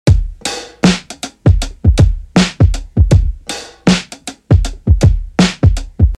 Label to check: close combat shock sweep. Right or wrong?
Wrong